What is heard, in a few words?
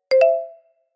Alert notification